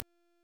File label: Signature Pistol Last Shot; Warframe; Tenno; Temple